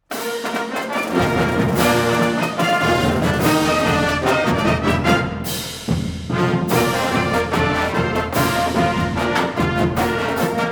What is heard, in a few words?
fight song, marching owls; FAU